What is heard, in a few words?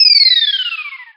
Sfx